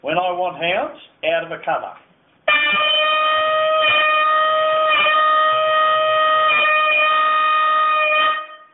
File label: get hounds out of covert, calls